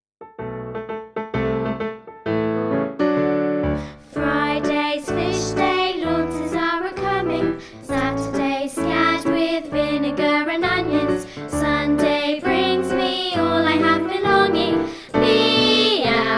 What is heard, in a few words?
Finale vocal; Mousehole Cat